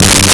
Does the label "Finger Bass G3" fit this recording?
Yes